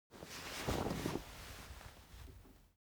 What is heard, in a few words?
object chair camping rise; smartsoundfx